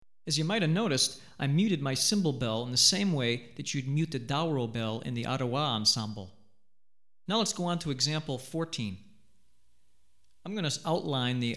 Adowa, Drumset Example, West African Rhythms For Drumset; Hartigan